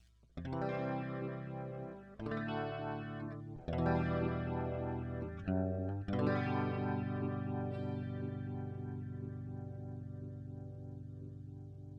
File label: guitar chords reflections only output slow